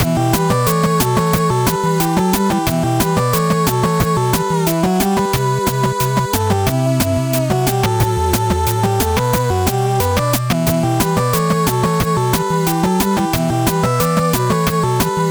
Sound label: 8bit boss; Magic GameClient